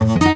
Guitar Missed note; Swamp; Music Ray

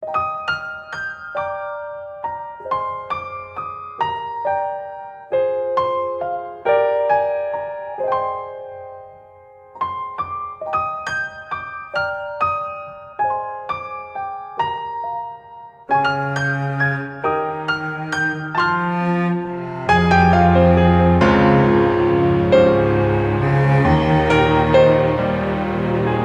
BGM sad; witch spring 3 android ios mobile gamerip 2017

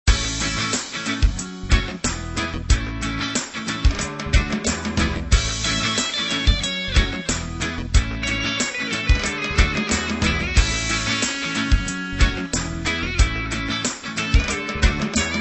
Game Loop; SpongeBob SquarePants Trail of the Snail

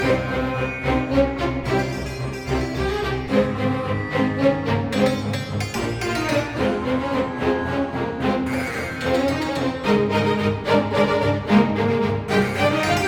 Garden ACTION STRINGS loop

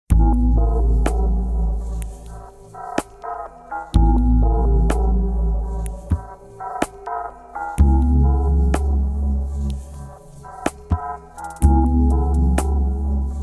Chord Intense; beyondium; android ios

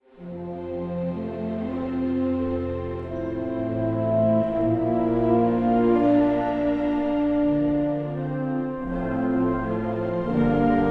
plunger loop; DW How to Train Your Dragon

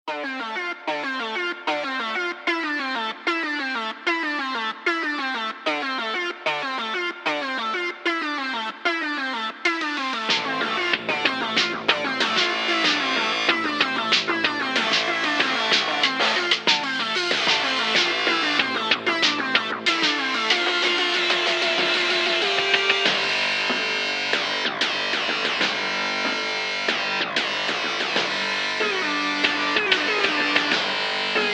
MAIN THEME 8bit LIGHT

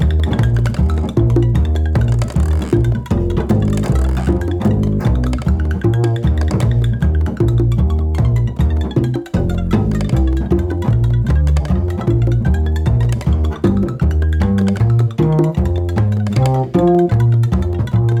Sushi Bar Frantic Loop; Unspottable; Xbox Series X|S; Mouse Mode

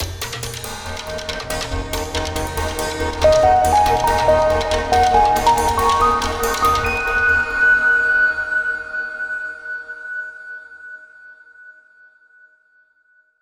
REWARD

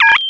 Key Card; Alterna SFX; Level Start

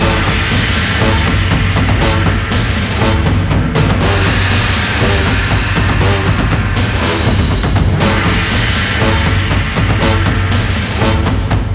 TENSION LOOPABLE

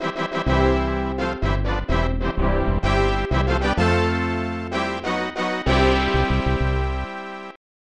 Fanfare; Super Keiba 2; SNES

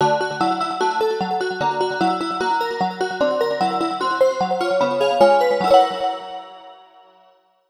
Train Jingle; Inkopolis Plaza

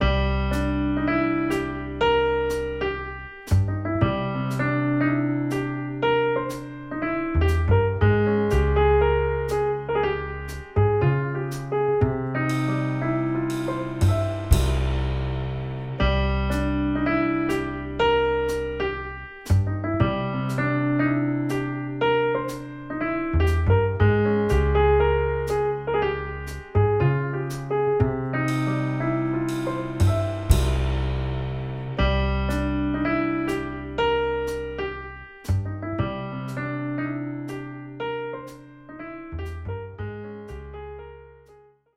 Music Hub Swamp Intro; the princess and the frog

